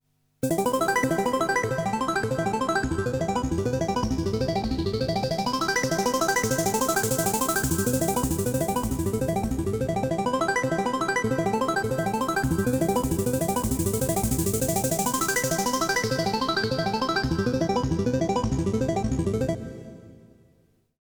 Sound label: fat plate